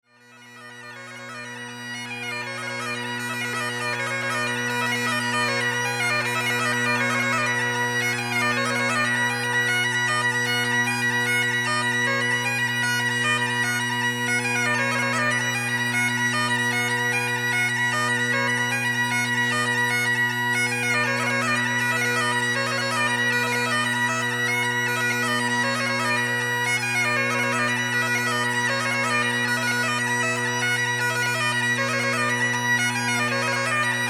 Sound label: Highland Bagpipes; Weddings